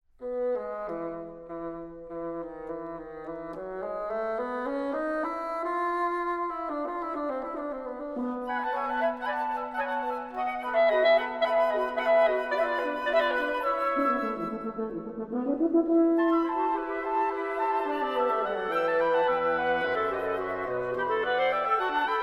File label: Quintet, Allegro ben moderato, Woodwind Quintet